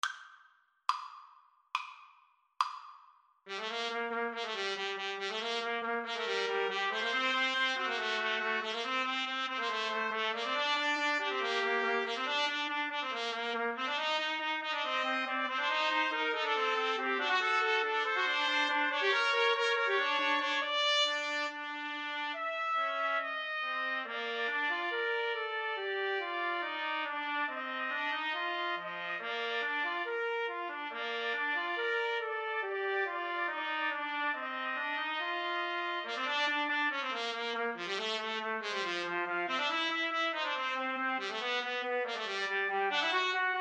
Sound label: TPT trio, Trumpet Trio